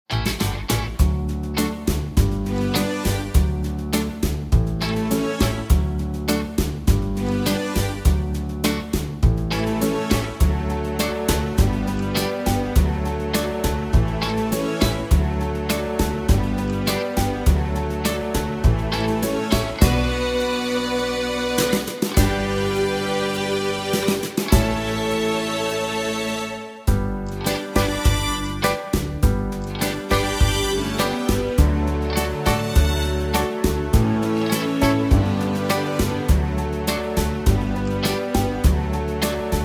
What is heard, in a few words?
(D) No Backing Vocals